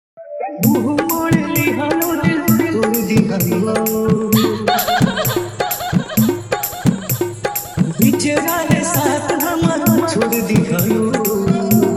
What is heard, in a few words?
Hard Jhan Jhan Bass Mix